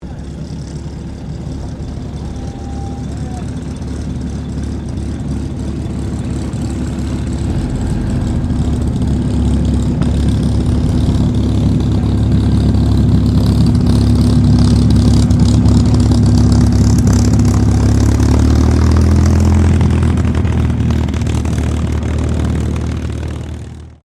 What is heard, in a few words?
AIRCRAFT CAC CA-18 MUSTANG MK23 TAXI; CA-18 /P-51 Mustang; Airplanes